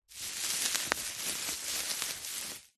plastic packaging film bubble wrap handling movements; office